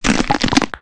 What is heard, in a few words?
objects, World of Tanks